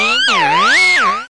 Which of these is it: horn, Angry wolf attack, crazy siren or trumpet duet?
crazy siren